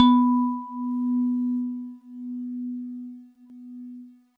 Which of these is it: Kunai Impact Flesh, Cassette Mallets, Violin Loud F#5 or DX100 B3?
DX100 B3